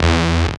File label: DR. LOOP, BUZZ; X-Static Goldmine 3